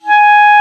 Clar p G#4; Digital Sound Factory Virtuoso

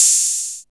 HH OP, HH OPEN; AKAI; USB Soundscan vol.20 - Fresh Disco House I

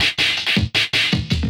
Loop, Drum & Bass, 160 BPM

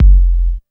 KICK deep, Techno Trance DRUMS

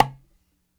Cahon loud Mute, World Percussion One Shots; Sony CS

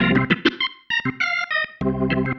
Single Riff, Organ; Sonic Foundry (Sony Creative Software); Joe Vitale Organ Donor

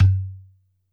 Udu Low, YAMAHA RM1X

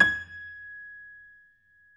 piano; East West; The Ultimate Piano Collection

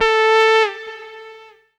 lead syn, Techno Trance

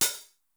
909 OP HH; TR-909; Hollywood Edge; The Big Bad Beatmachine

